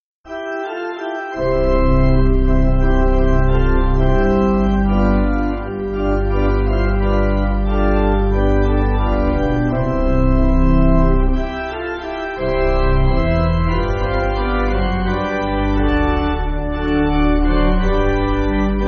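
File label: Pipe Organ, Free Music Backing Files for Churches; Pray The Lord; Etoile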